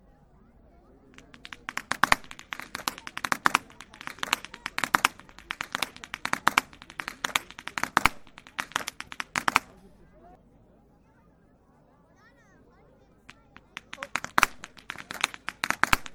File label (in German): schnalzen; jugend